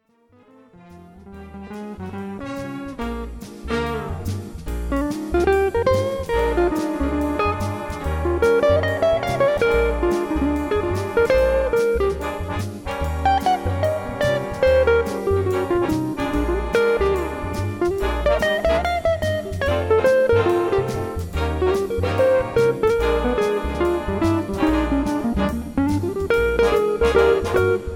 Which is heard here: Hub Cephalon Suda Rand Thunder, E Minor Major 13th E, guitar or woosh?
guitar